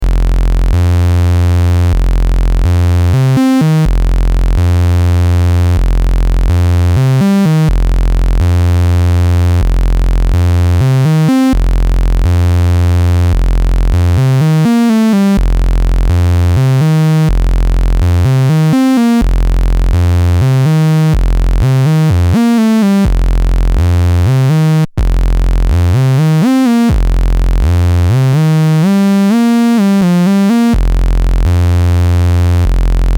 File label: Roland SE-02 Osc 1 Saw Tri Pure